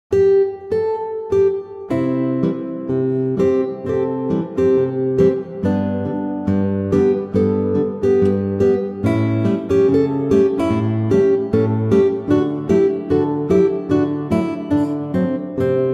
jazz

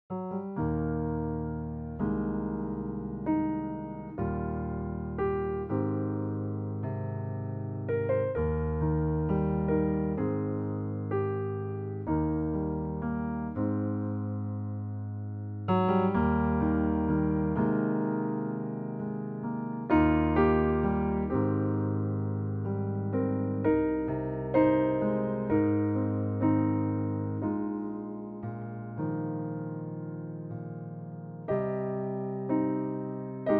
Binaural Reverb attempt with Bechstein

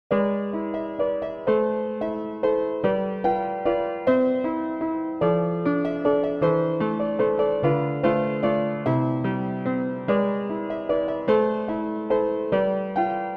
Waltz; E Melartin; Op. 23 no. 2.; Pianoteq; Modartt

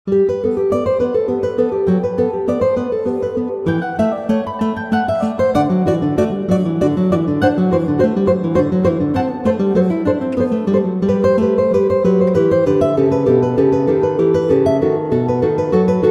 2 Guitars Prelude Bach

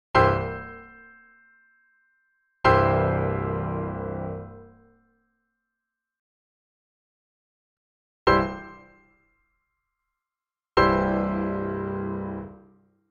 Chord test

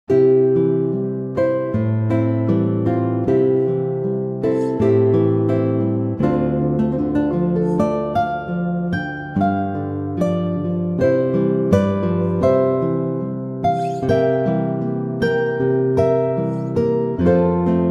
Guitar Gentle

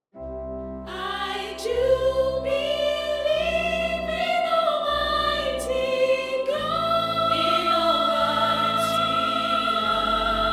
Dynamic OrganTeq Choir

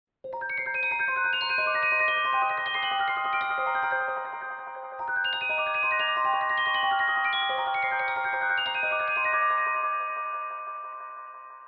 Celesta Solo

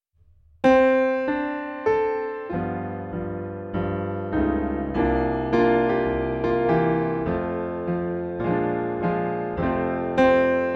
Steinway Sons Grand; SonsGrandDb

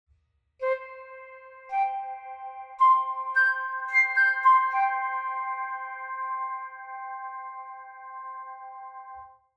Flute YC5 Basic FX

